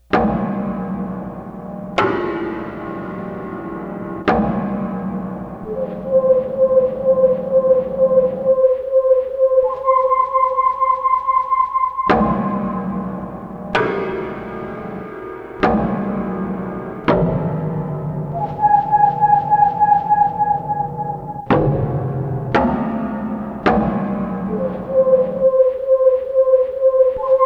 SUSTAINED PIANO PLASTIC HOSE

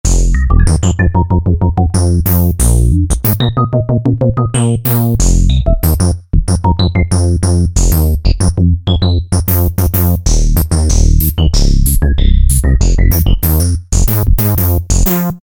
Fizzoid Bass, Roland JP-8000; Factory Performances

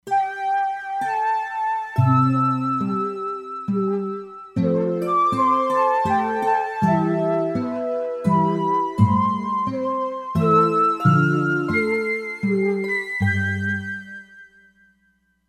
Spanish Ney, E-mu Proteus-3; Factory patches

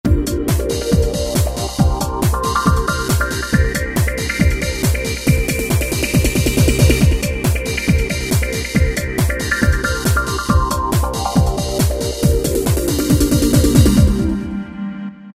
Sweep Trance; Korg ES-1; Factory Patterns

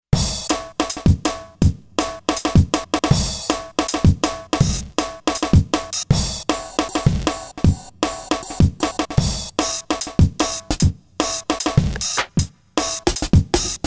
DnB Gate Kit

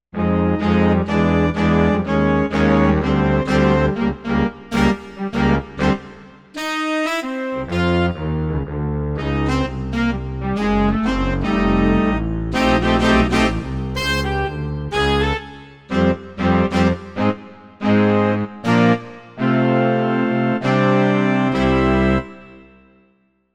Sax Band

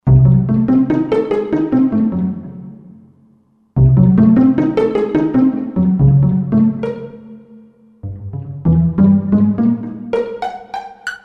Bass Pizz; Roland JV-2080

